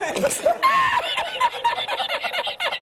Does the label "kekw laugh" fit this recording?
Yes